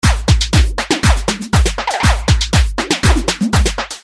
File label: rhythm loop Elastic flanger; Sound Graph